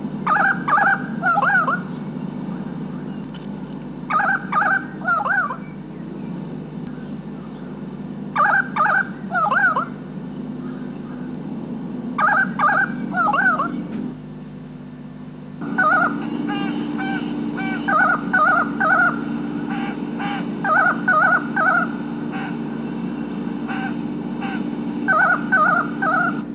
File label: currawong